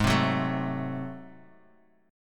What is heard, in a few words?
A flat 7th Flat 9th A flat, chord